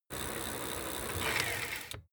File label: bicycle street brake slow